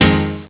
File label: Ring, Cisco Unified IP Phone 7945G